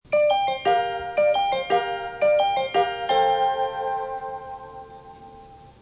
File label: pass, station; hanshin